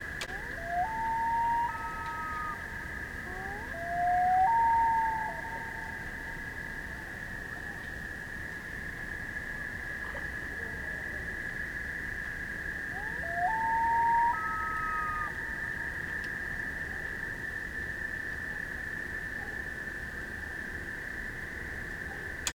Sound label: loon solo